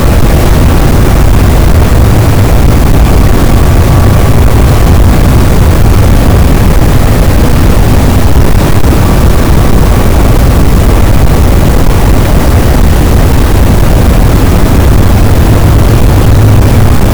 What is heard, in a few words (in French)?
cachalot, gaussian noise; Physeter Macrocephalus